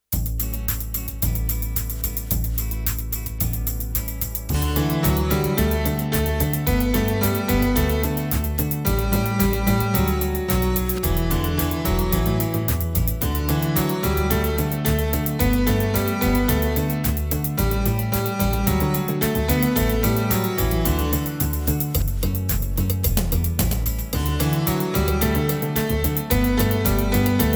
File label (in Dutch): karaoke; Stap maar in de auto